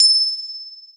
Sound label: recharged; gamerip; temple run